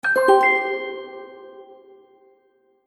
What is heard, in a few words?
Magic Purchase Ding; Cartoon Sound Design